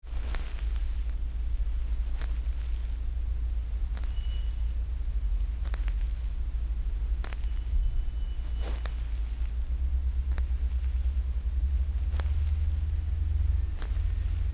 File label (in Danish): Pude med kamel, meget stille roterende lyde, contact sounds